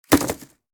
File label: Hamper drop floor impact; basket